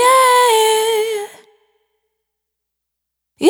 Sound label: Urban, Single Voice Melodic Loops, Vocal Samples